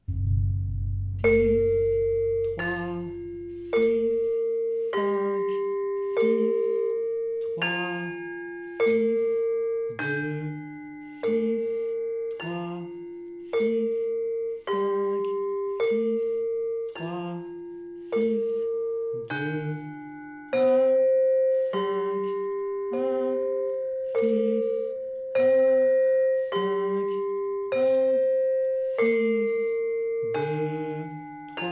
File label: balungan